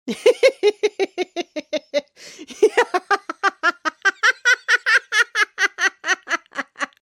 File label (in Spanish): risa señor